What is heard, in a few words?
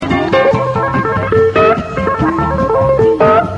Hammer